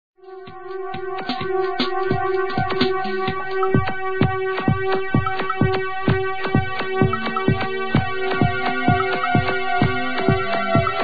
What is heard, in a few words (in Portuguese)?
ritmo